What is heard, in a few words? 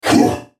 effort; Jayce; Sentinel